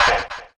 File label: Zappy Fx